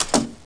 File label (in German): Uhr Zu